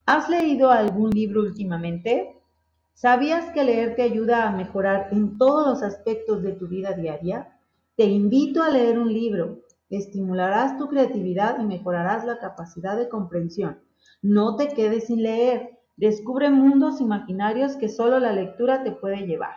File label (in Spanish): intro terror